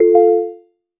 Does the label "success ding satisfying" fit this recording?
Yes